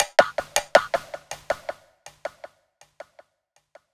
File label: percussion one shot; Slate; SD PASADENA Pop Sample Pack